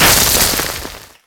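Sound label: destroy bag beans; Explosions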